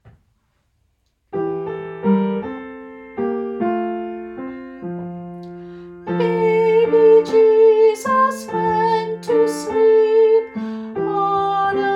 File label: with vocals